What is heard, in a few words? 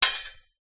impact metal drain stone